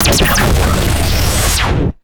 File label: distorted sci fi laser blast and hit; lasers